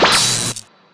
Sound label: Blizzard Anime 80s Cheesy